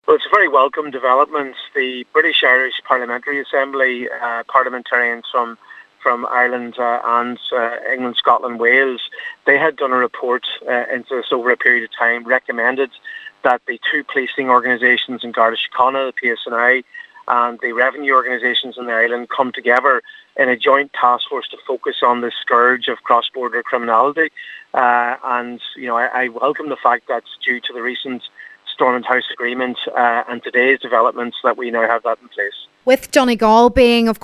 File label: pad